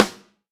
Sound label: Dnb snare